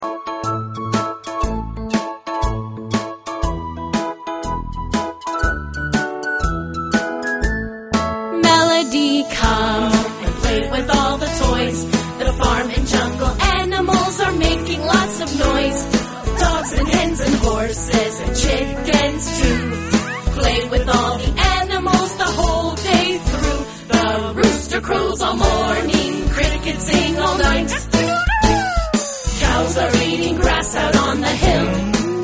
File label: melody